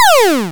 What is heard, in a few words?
retro laser shot; Retro Game Weapons Sound Effects